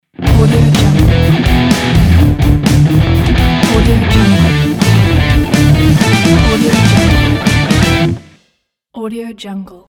energy rock guitar logo

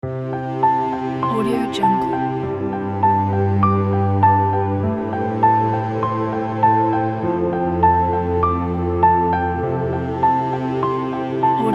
cinematic epic piano

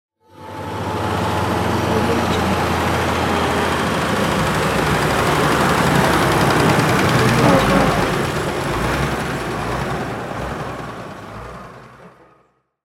vehicle pass by

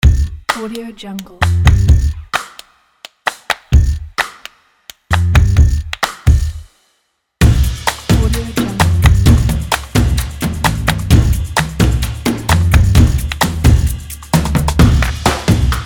the percussion

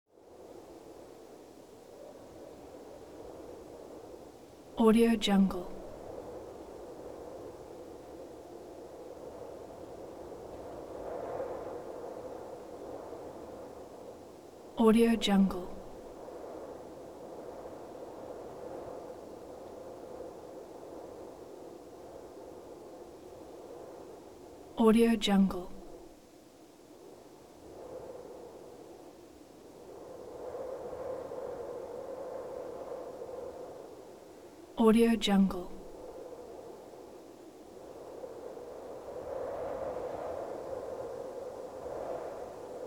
light wind ambience

